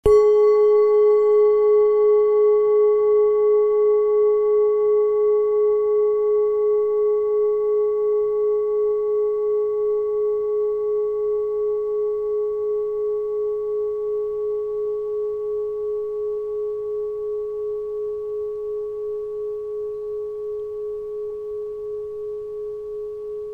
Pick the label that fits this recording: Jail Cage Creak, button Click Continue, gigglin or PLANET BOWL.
PLANET BOWL